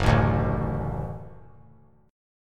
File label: F sharp Minor Sharp 5th F sharp; strummed; JGuitar